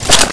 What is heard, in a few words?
ammo pickup; alienshooter